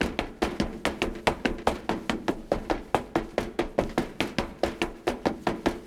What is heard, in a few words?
city wall run